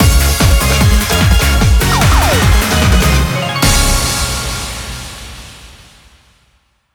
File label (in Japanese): BONUS 入賞ジングル